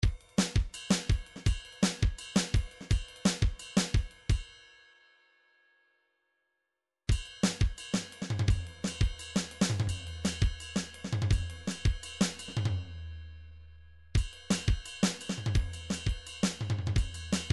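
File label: drums cymbal, midi; Paul Kodish Dangerous Drums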